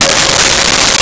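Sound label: Jazz Guitar Db6; Nintendo; July 2020 Gigaleak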